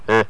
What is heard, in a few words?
Primate Grunt